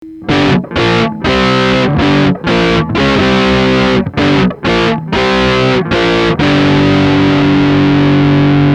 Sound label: pedal